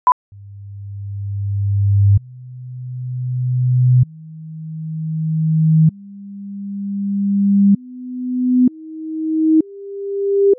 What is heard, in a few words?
Test signal (44 kHz 24-bit) THD (set of tones)